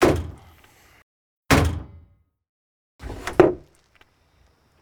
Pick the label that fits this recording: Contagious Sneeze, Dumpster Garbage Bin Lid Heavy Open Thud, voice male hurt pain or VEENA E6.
Dumpster Garbage Bin Lid Heavy Open Thud